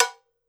JOPA BELL, Brazil Drum Perc; E-MU Producer Series Vol. 7 – Old World Instruments

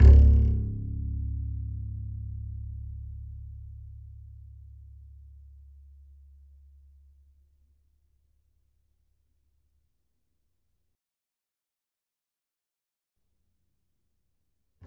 E, E string upright bass; Larry Seyer

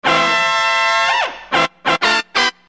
Horn Loop, Hip-Hop; Construction Kit; Sonic Foundry (Sony Creative Software)

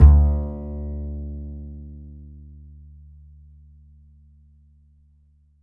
A, upright acoustic bass, A string

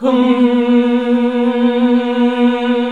HMM B1; Best Service Gigapack 1

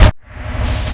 REFRAME, DRUMS PERC; Q Up Arts; Dream Experience

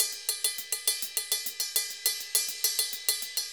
Ride, Festa Latina, Drums & Percussion; Best Service; Festa Latina VOL-1

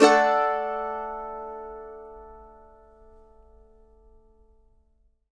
mando maj; Sampleheads; Whole Lotta Country 1 & 2

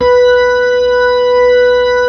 B-3, Full Xfade

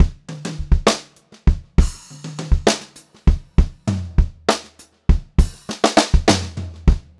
Pop-Rock Drumloops, 100; USB Soundscan vol.37; AKAI; 90 s Sample CDs

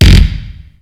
k1, Kawai K1 Drum Machines; 300 Drum Machines

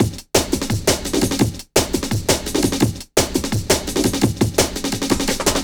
Some Drum, Drum & Bass; Fluid Dynamics

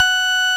Roxichord F#5, RMI E.Piano; Keyboards of The 60's and 70's

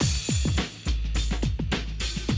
Classic DrumLoops

